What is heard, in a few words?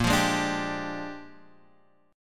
A sharp 13th A sharp x,1,3,1,3,3 down Guitar Standard; strummed; JGuitar